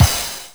Crash (16769Hz); Sonic 3; M8; Genesis

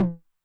HI TOM, High Tune Short Decay, 909; classic machines

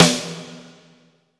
SNREV; AKAI S-Series CD-ROM Sound Library VOL-1; AMB DR

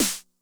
Snare Drum, Med Tune Snappy, 909; classic machines; 909mod

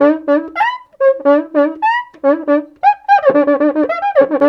Rio Batucada, 108; Spectrasonics; Supreme Beats; World Dance

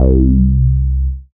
synth c, Euro Style; Best Service ProSamples vol.37 - Dance Synths

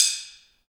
Ambient Stix, Afro Wood

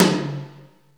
Kawai r-50; Drums

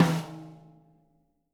Living Drums, JZ XTOM 1

